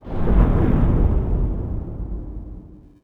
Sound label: polycraft explode